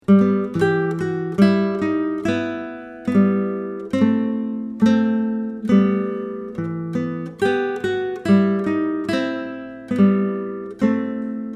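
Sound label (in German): Russian Style, Akustische Gitarre/Klassische Gitarre: Duette; leicht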